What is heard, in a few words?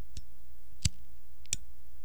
lighter flick